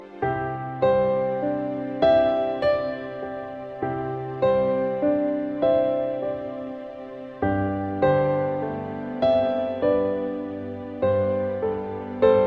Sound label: Little Melody